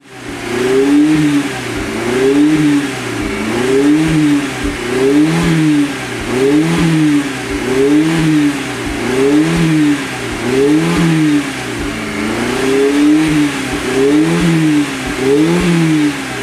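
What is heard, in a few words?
GT type 2 JTS, Alfa Romeo; unicorse-italial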